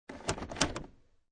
Transportation Automobiles, Car Interior, 1996 Acura Integra, Shifter, Shifting From Park To Drive, No Engine